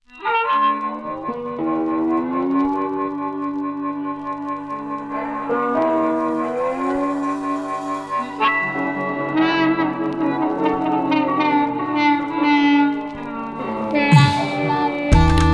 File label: Blues